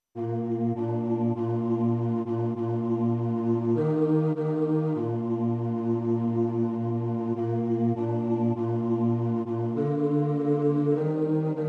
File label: Bass, rehearsal